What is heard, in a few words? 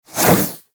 Warfan Whoosh, Weapons Melee; Warframe